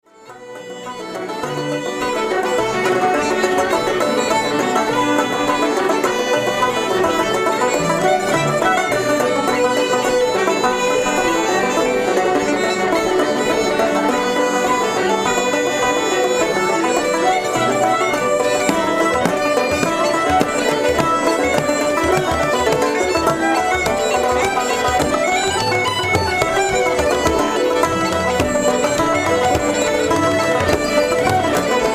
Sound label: Irish session